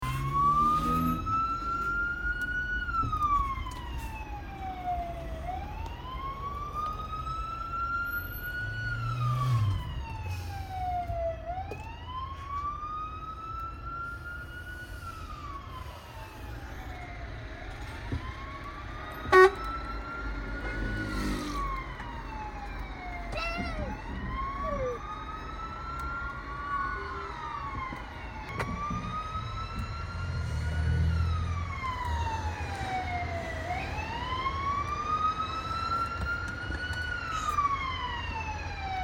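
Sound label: sk mchs, ambulance siren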